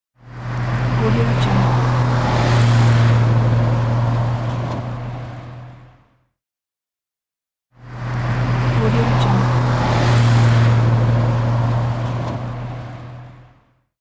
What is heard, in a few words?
pickup truck pass by on highway